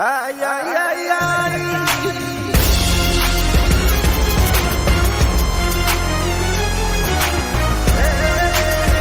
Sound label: theme; jojo; pillar men